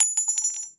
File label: casing